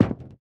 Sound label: mech armor land concrete; Factorio